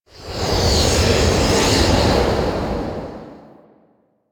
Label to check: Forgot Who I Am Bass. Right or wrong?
Wrong